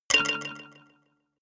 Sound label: notification kalimba delay fifth